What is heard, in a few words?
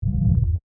Whoosh Low End, Movement; Whooshes; BlastWave